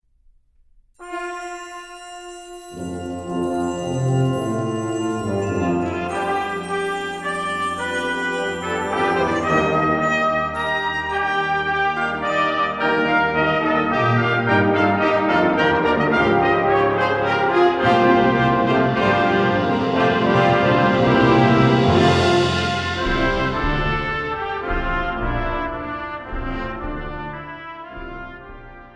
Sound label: Fanfare Prelude; Lobe den Herren